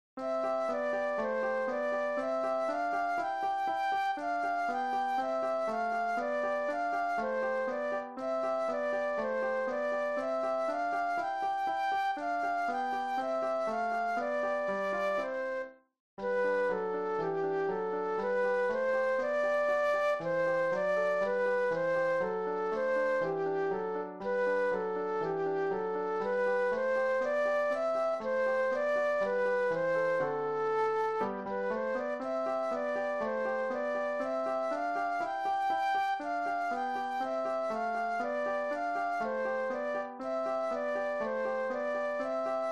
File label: Flute Solo; classical